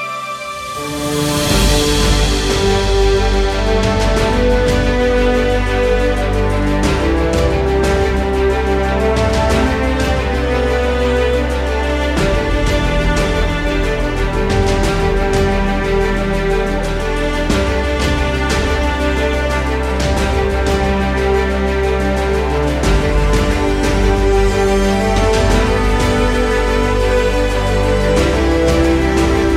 Samsung Galaxy A12 Ringtone; (India)

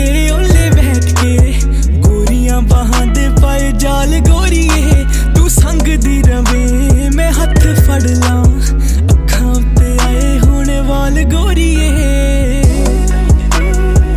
Ringtone, Punjabi

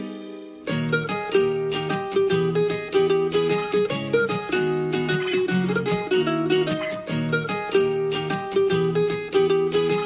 Ringtone, Punjabi

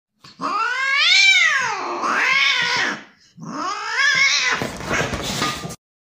Cat's Fight Ringtone

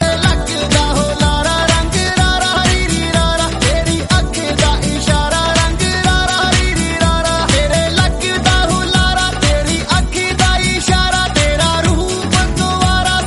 Bollywood Ringtone

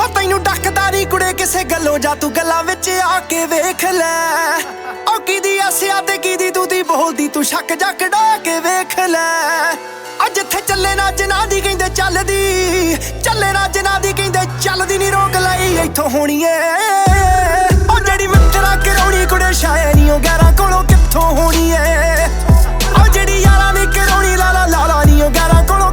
Punjabi Ringtone

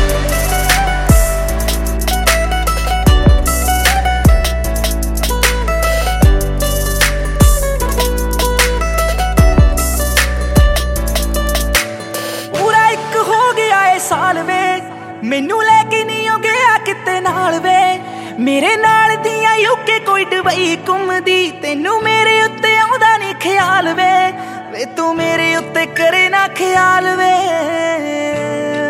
Ringtone, Punjabi

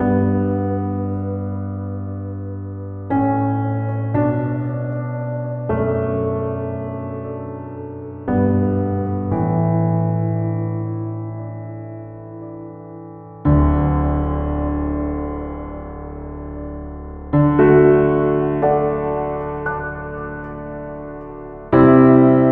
Samsung Galaxy A13 Ringtone, Samsung Ringtones; (SM A137)

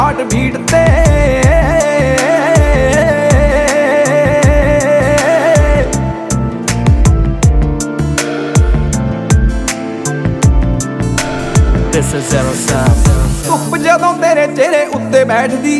Punjabi Ringtone; New Punjabi; Latest Punjabi Songs

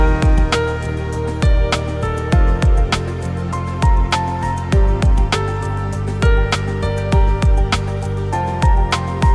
Notification Ringtone; Vivo Y77 (China)

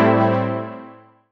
abstract msg Ringtone

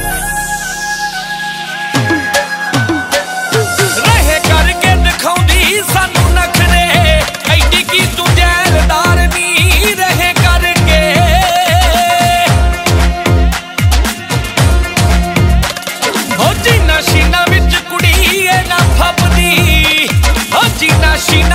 Ringtone, Punjabi

